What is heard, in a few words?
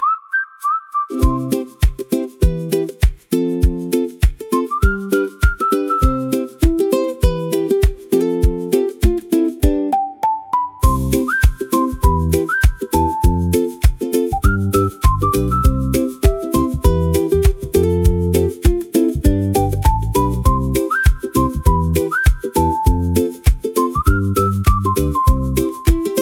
ukulele tropical for bright and light